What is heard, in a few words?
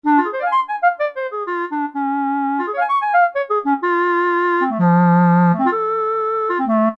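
wind Clarinet, E-mu PK-6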